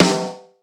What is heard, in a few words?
solid acoustic snare sample F sharp key